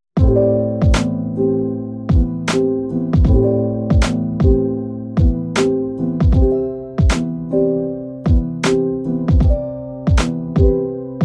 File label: Downtempo